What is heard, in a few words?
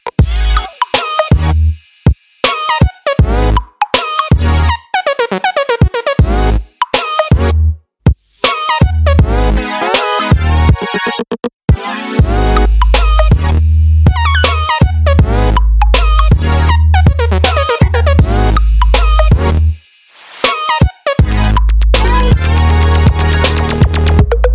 i phone 3 g s point morning ringtone